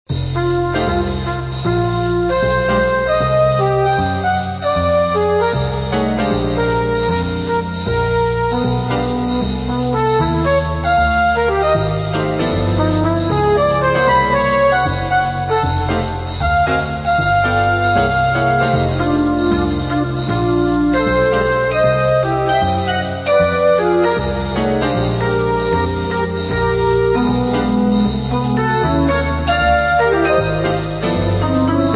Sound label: 12 tone 12 interval blues